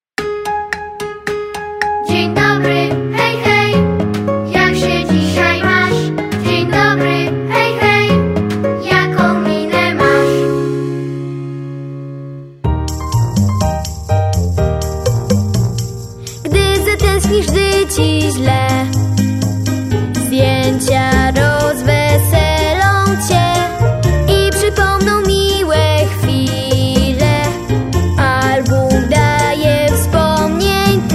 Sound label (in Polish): plas wokal; szesciolatki; multiprzewodnik